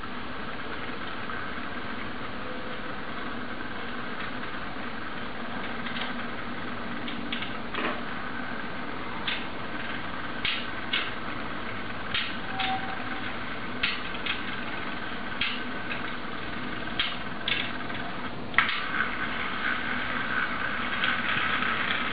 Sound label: mechatronical mantra